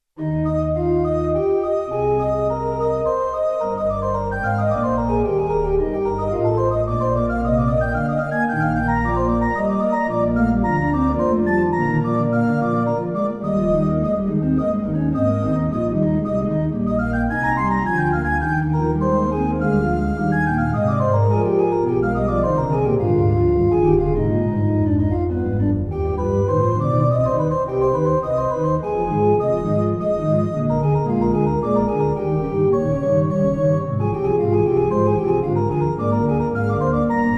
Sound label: Bach Sonata Eb, on Clavinova CLP-280; Bach Sonata 1 Eb III